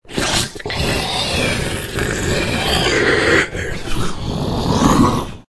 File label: maw breathe; chungo